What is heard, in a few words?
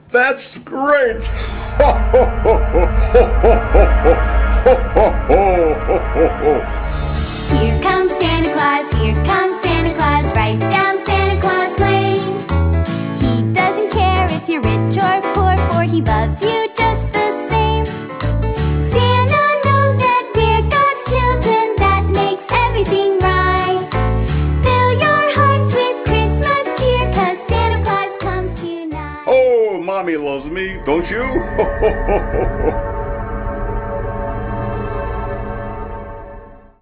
weird ho ho ho; spawngirlsounds